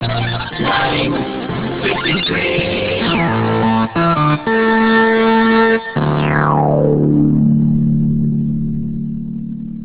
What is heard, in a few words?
old news jingle